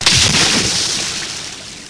splash

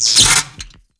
Sparks

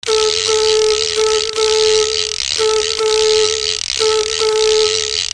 ratchet-whistle

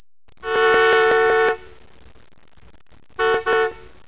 car horn

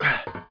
ladder; Daikatana

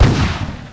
ShotGun Fire; Marathon Goodies; PoorMans M2 Weapons 3

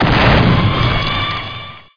phoenix power hit; Heretic II demo; weapons

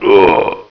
Male Death; Ultima III

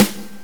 snare drum; gmplay; drums

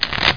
shuffle

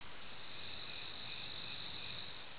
BIRD

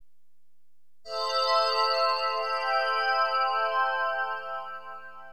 5th bell, keyboards; HipHop Keyboards; LiveAct Pool 1

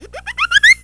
wowowhee

